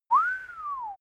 male wolf-whistle; soundpool

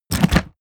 mechanical lever wood small down; industrie-wirtschaft; mechanik

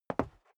step dress boots concrete single stair up; menschen; schritte

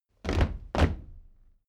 step military march stop

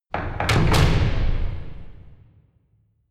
closing church door hard

